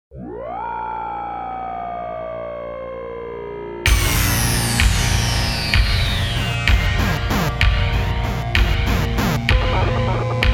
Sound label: Techno